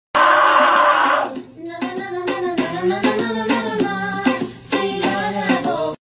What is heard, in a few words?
screaming dancing; audio meme